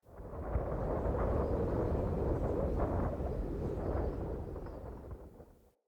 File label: Fort Wind Gust Low Mid Howl; Ambience